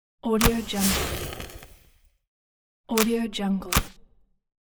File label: match